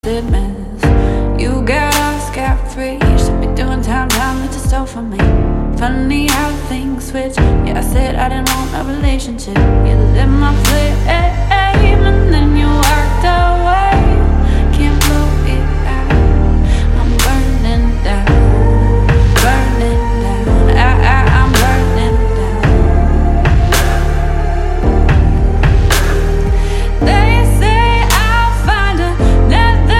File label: Pop Version; MIUI12